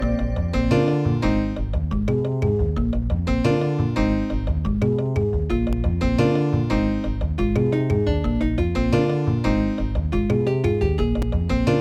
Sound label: aztech sg waverider32; Soundcard test page; AZTech SoundGalaxy Waverider 32 Plus; Hocus Pocus